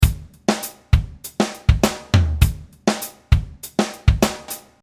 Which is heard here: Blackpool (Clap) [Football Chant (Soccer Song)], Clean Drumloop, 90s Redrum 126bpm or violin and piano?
Clean Drumloop